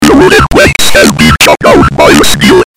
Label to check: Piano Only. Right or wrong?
Wrong